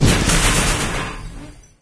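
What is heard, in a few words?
stalker train extra bump; video games; source engine; episodic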